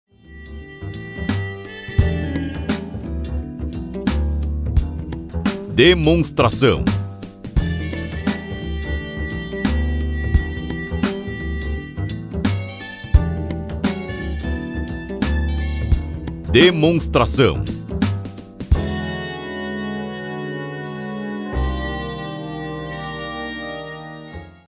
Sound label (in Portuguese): Trilha